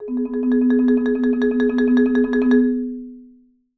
Marimba roll E3; Sampleheads - Dave Samuels Marimba & Vibes

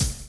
DRUM CHOP; AMG Gota Yashiki Groove Activator

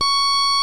60's Organ; OMI - Universe of Sounds; EII Factory Library

